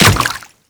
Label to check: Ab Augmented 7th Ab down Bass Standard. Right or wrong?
Wrong